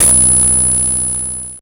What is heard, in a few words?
Title Transition Digital; Production Element Title Transition